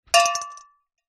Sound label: human tooth spit out drop into metal bowl dentist